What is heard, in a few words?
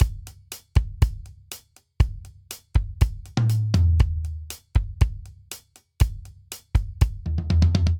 Bossa Nova Breakdown 120 bpm, Drum; 4 4; MIDI Clips; Ableton; Factory Packs; Drum Booth